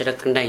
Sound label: drum; Gta